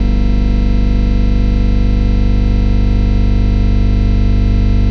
Drone Static; Drums & Drones

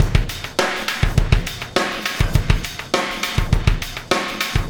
Oscillation Beat

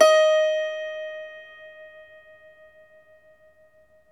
IRISH HARP

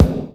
BD, DR BD; Best Service Gigapack 2

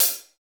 CYM X14, Naturals; Club-50 - Foundations Roland; Natural 10

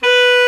ALTO SAX B4; Greytsounds VOL-1

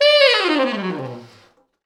T Sax falls, Horns Sax

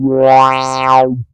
Single Electric Basses, Dance Floor Bass

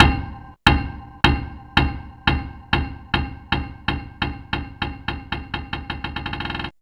METAL BOUNC, HAMMER PERC; Zero-G Ambient 1

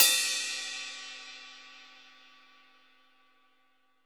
RIDE, GM DRUM SET; AKAI S-Series CD-ROM Sound Library VOL-8

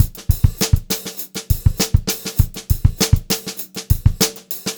VAR, Funk-Groove Drumloops; USB Soundscan vol.38; AKAI

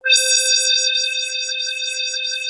LFO LEAD C4; USB Soundscan vol.49 - Analog Fantasies; AKAI

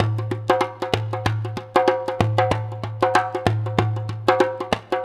Dumbeck, Percussion; Sonic Foundry (Sony Creative Software); World Pop

